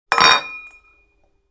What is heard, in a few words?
Wine glass falling without breaking